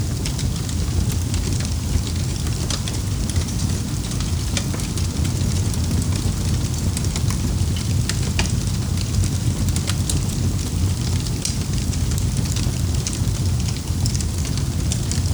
fire burning

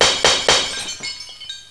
glass breaking, object hit glass breaking

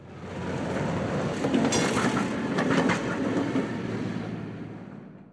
train pass; railcar

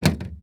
car handle lock; cargo

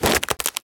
cardboard box break

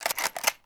desert eagle reload

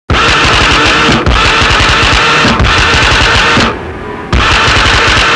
ELECTRIC; power drill

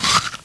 apple chomp

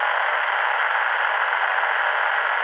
tuner noise; station

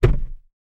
car trunk close

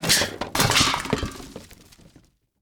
metal roll drop; scrap

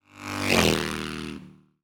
motorcycle passing

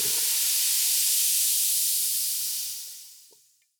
gas release

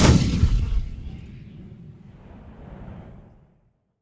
cannon tank fire; mortar

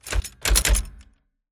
typewriter handling, hands

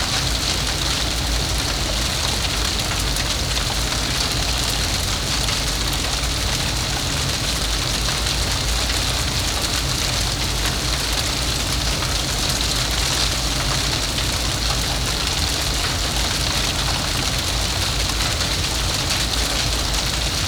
fire burning; torch

